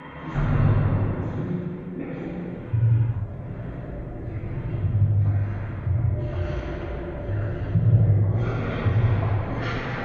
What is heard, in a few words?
Sinister Dealings Ambience